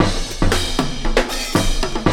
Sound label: BRUTAL 116, loop, 115-121 BPM